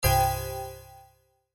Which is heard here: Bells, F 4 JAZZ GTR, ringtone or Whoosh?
Bells